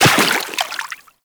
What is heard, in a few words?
Operator Walk Water, Footsteps; Warframe